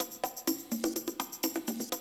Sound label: 125, Classic Drum Loops